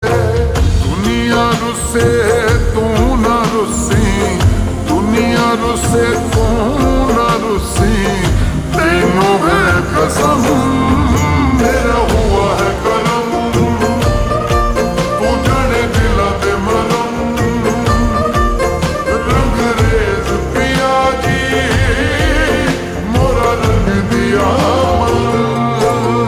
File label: Ringtone slowed and reverb